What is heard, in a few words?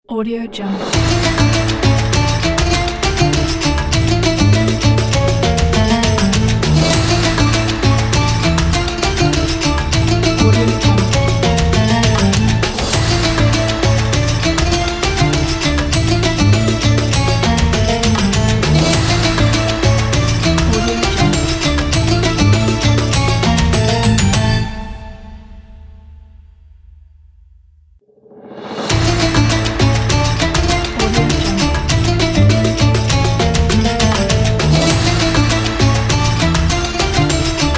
ramadan intro ident